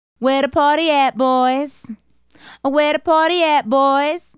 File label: Female Solo, Hip-Hop and RnB Vocals